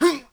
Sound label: jump hard; characters